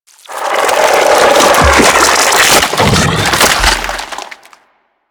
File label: Infested Hive Swell Movement; Ambience; Props; Warframe; Corpus Infested